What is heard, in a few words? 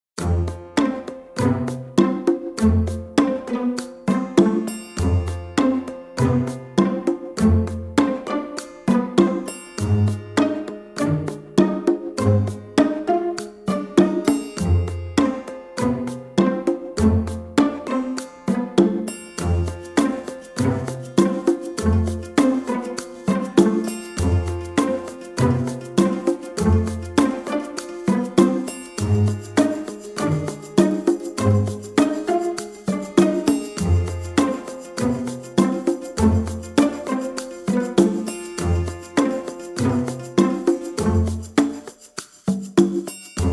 Quirky Housewife style 100bpm